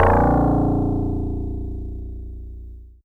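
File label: Easy Clav DX100 A#0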